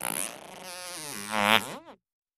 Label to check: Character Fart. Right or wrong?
Right